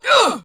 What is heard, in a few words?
Pain, Battle; Warframe; Dax; DaxRanged; en